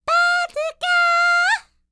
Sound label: Vocal Planet World Voices, NOMADIC